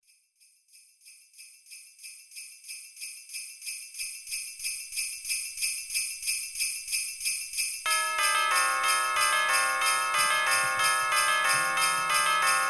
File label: drumless; Carol Of The Bells